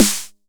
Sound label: 909 SD; Goldbaby Tape808 & Tape909; The Tape 909